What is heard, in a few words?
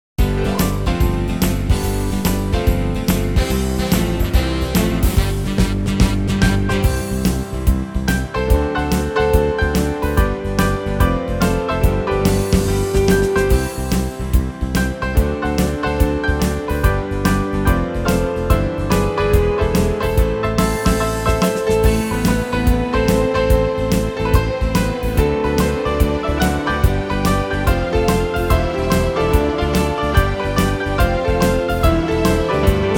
JIVE